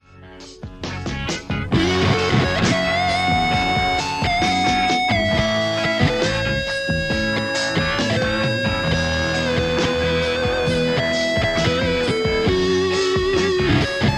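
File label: Telecaster; igniteamps emissary v1 00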